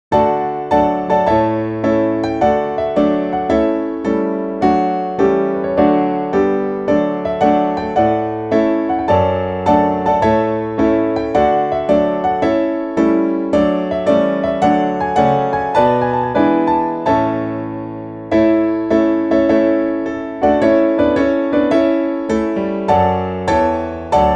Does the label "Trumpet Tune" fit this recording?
Yes